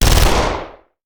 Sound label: Machine Gun; Sound Ideas; Guns